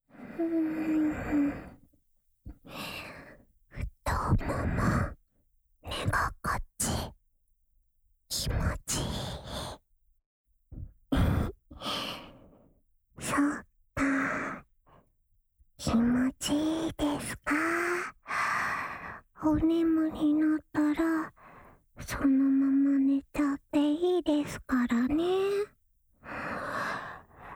采耳＆亲吻耳朵（左耳）

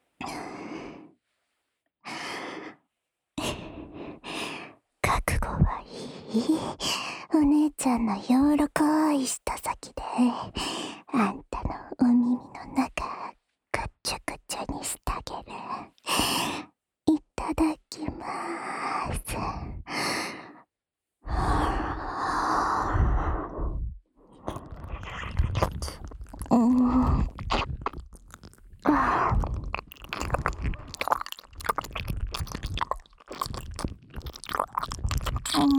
激烈又深入的舔耳(右耳)

